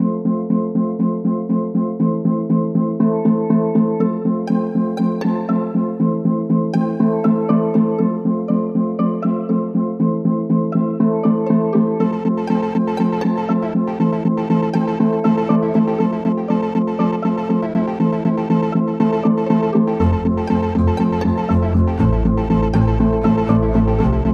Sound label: No Horns No Percussion